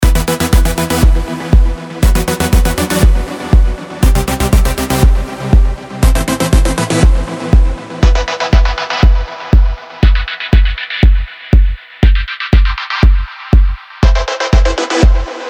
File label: Kick